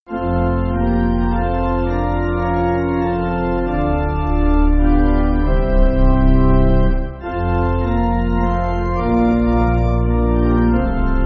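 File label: Pipe Organ, church music; Shepherd Divine Thou; Winterbourne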